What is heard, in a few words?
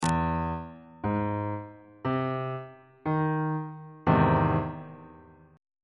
f flat augmented major 7th chord on bass clef